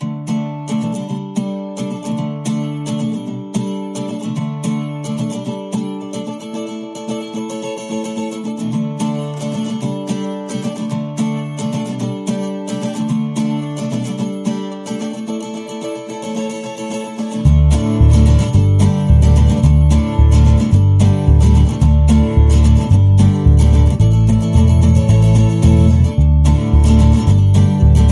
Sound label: Farmhouse Indie